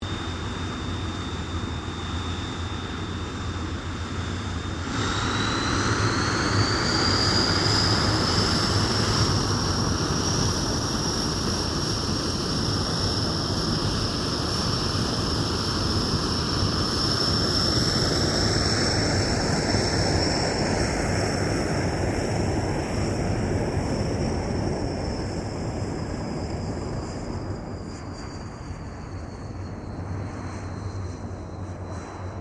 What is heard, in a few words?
AIRCRAFT CA-27 SABRE TAXI; CA-27/F-86 Sabre; Airplane; Airplanes; Vehicles